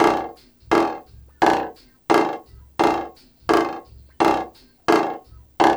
CONGA, Loops; East West; Funky Ass Loops

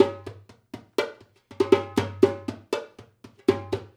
Dumbek; Essential Percussion

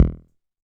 Kick Delay, TR-606 Bent Samples; Sonic Foundry (Sony Creative Software); The Electronic Music Manuscript Collection; Battery 3 Sample Kits

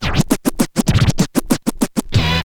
scratch kit, vinyl

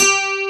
AC GTR, JV 1080; Pro-Rec - Synthsonic

